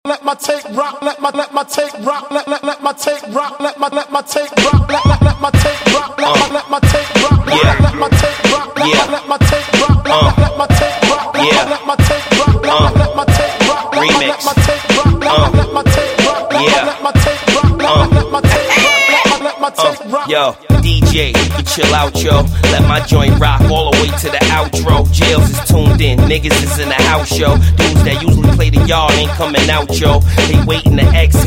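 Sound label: freestyle, Hip Hop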